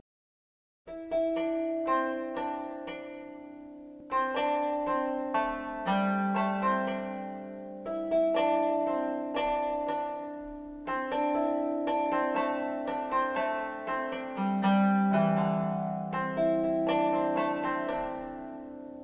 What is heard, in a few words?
Traditional Japanese Koto; Suiryo Bushi